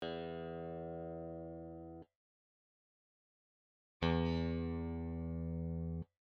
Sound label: Bridge match Neck